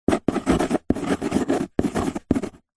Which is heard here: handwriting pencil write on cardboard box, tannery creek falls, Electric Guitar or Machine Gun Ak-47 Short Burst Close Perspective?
handwriting pencil write on cardboard box